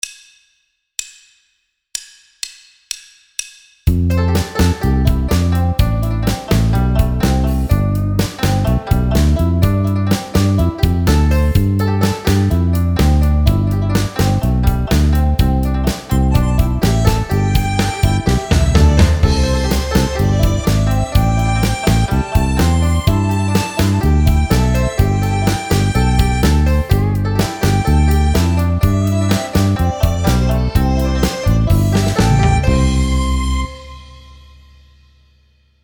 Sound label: Chinese New Year tune Accompaniment only